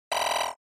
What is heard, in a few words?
SNES Shooter Damage